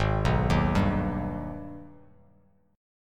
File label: Ab Minor Major 7th, Bass Standard; arpeggiated; JGuitar